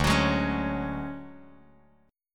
D-Major Sharp 11th, D, Bass Standard

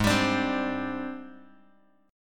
G sharp Major Sharp 11th, Bass Standard; G#M#11 strummed; 16,22,24,17; JGuitar

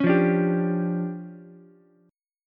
D Minor Major 11th, down, Ukulele, C6; DmM11 strummed; JGuitar

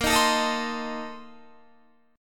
Bb Major 7th Suspended 2nd Suspended 4th, Guitar; strummed

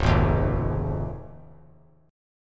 C sharp Major Add 9th; C#add9 strummed; JGuitar

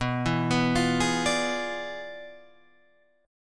E 7th Flat 9th, B

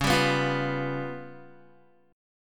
C sharp 7th Flat 5th Guitar, chord; C#7b5; strummed; JGuitar

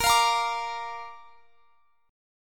A sharp Major A sharp down, chord; strummed

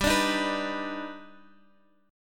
D sharp Augmented Major 7th

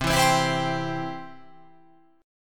C Major

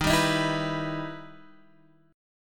E Minor Major 7th D sharp

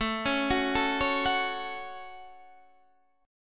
Gb Minor, guitar

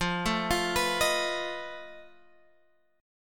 F 7th Flat 5th F down Guitar Open G

